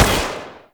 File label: Rifle Prime Shot A; Tenno; Bard